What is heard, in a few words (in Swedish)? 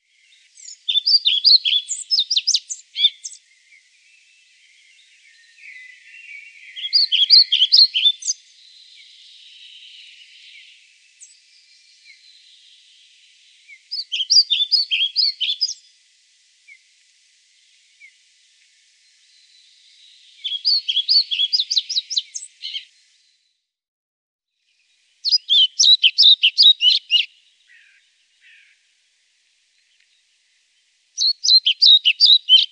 Svartvit flugsnappare, Faglar